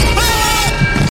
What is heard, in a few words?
aaahhh